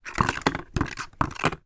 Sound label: sport table tennis ping pong balls place back into card tube